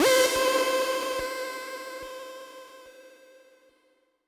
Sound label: Synth C, Synth Prophet One Shots; Electronisounds